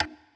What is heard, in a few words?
glitch tile; gonner linux macos ps4 switch windows xbox one gamerip 2016